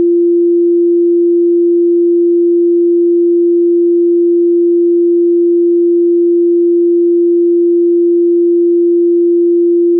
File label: sine